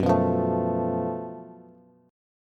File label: Gb Minor Major 7th Double Flat 5th Gb Bass; strummed; 14,14,15,14